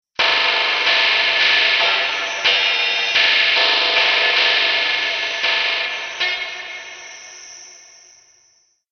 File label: Electric Glitch Bitz; miscellaneous electronic